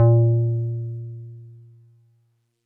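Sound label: Wood Piano DX100 A#1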